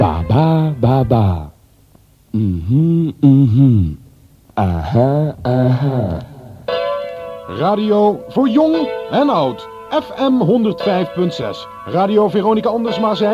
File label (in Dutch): Jingle baba baba, m-mm m-mm, aha aha; Station Calls